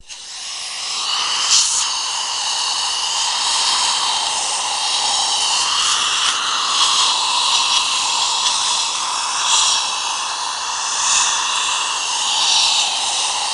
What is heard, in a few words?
Dental Suction Loop; hospital & lab equip